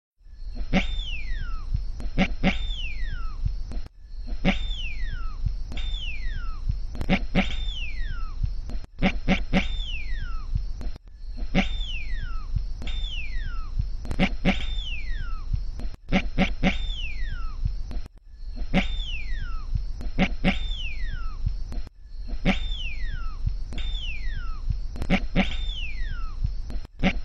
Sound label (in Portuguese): canto do mutum de bico vermelho